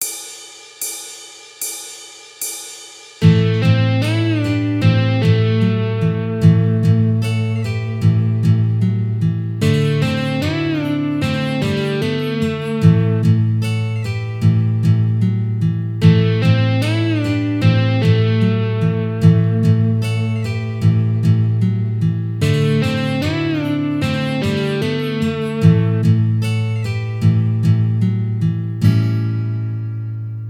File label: Lead Straight